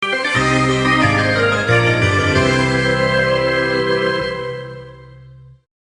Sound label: Level Complete!; Pizza vs. Skeletons